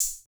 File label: Open Hat Hit; 090 Cmin